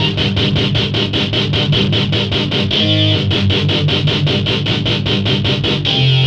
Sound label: Metal Guitar, Guitar Synths; Dr. Fink's Funk Factory